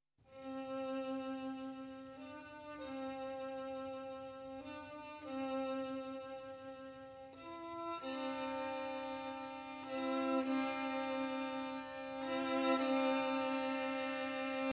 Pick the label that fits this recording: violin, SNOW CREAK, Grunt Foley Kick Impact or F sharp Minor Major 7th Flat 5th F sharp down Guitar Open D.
violin